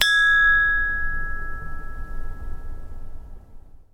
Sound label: chinese bronze bell side; sackler